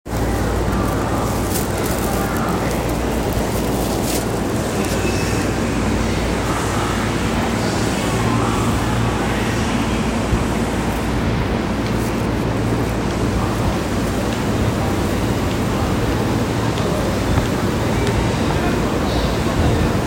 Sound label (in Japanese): 東急大井町線二子玉川西